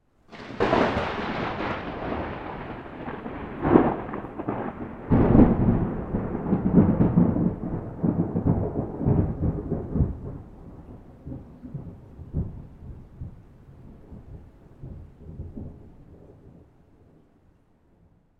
nature thunder

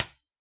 toy plastic ball single bounce; sound effects one

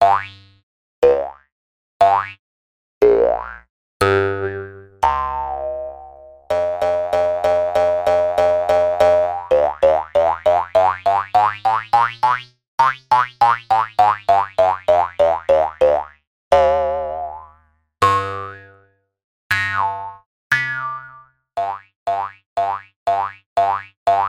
cartoon boings; zapsplat

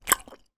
human eat chew sweet wine gum single chew; sound effects one

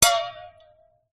impact metal small cannister flask water wobble

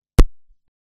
power off crackle; the sound pack tree

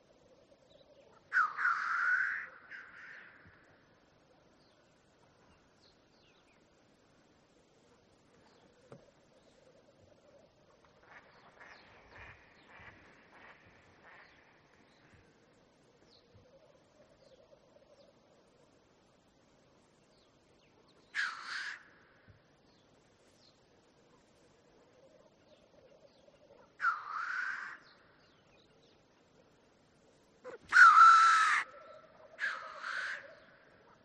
Bird Black Grouse; audio hero

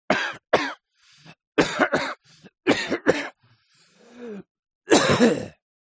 human male man cough; zapsplat

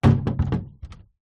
foley wood large board lean against wall

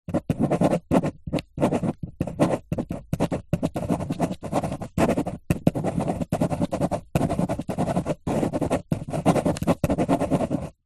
office pen ballpoint paper hollow table writing; zapsplat

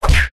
cartoon punch

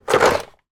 leisure board game box open; yahtzee

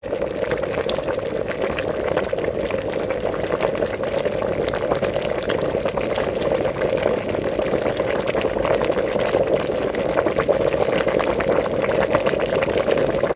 household water boiling in kettle with lid open

